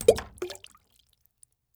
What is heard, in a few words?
Liquid Water Coin Dropping Into Tub Of Water